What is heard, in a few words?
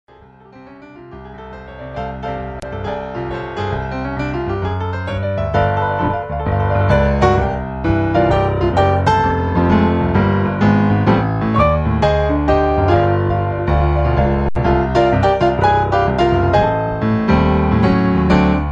solo